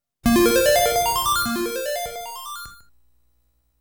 Treasure Chest; Falcom Special Box 91

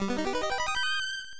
Harp; Risa no Yousei Densetsu; fds

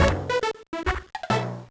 Win; Wario

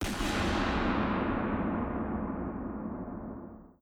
Gun Shot Reverb

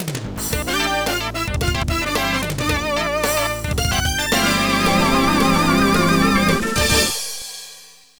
Race Start; Stadium Cross; arcade

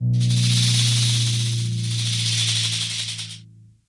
Iron cobra Death; Puzzle Chronicles; PS3; PSP; X360

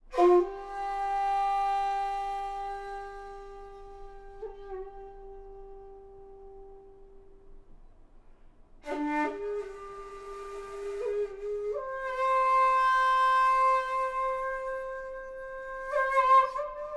Shakuhachi Meditation; Oriental Empires

